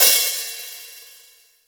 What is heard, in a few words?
roomy open hat f key